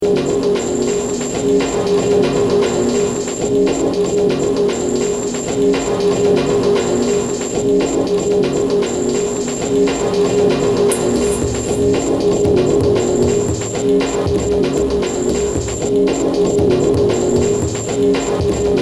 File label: Electro Breakbeat